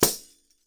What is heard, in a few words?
Percussion; Sampleheads - NYC Percussionworks; TI PERC VOL2